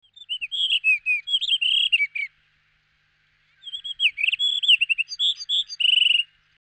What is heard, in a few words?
blue rock thrush, birdsounds